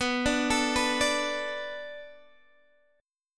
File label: B Minor 7th B Guitar DADGAD, chord